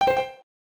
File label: GUI naming tab swap end; Pokemon Essentials; PKMN Estelar 1.3